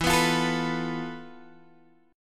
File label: F Major 7th Flat 5th E, guitar chord